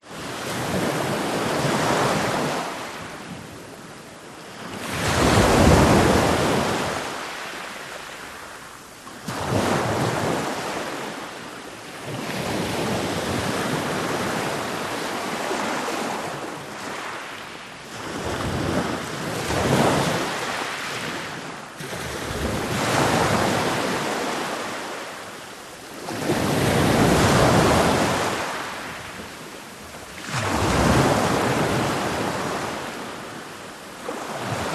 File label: cove rolling waves black sand water; Alexander Gastrell